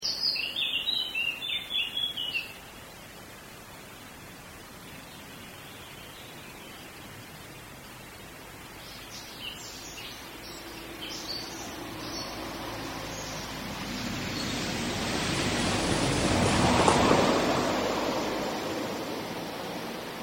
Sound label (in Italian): Interruzione canto per auto, bosco; Passaggio di auto interrompe il canto dell'uccellino; Ascoltando il bosco